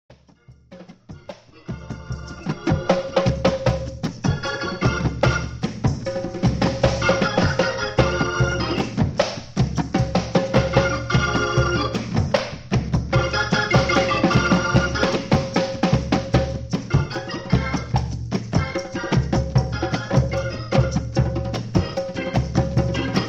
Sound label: Drums